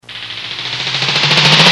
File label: flapping, office; machine